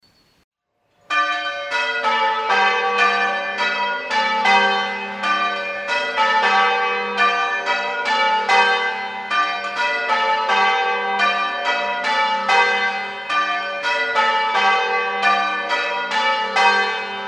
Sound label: Monk Soham Rounds, bells